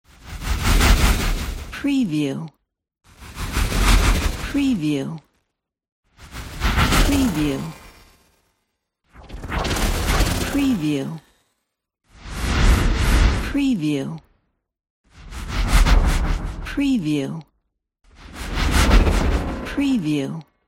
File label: glitch whoosh swoosh